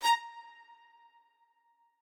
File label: strings